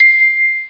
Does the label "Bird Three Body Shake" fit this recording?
No